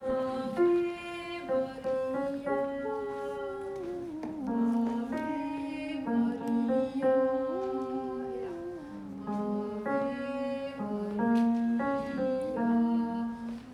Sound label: alto tenor